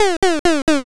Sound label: laugh single